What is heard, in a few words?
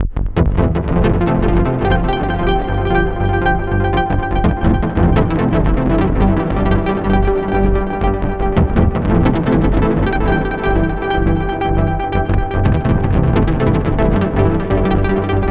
DIVA preset Fall INTO Groove